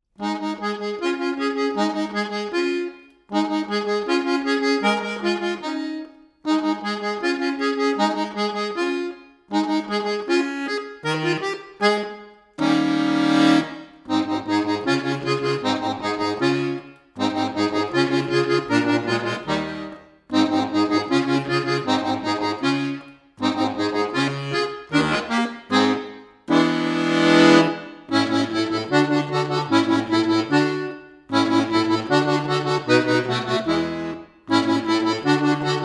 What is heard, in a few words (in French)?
jeu de pistes instrumentale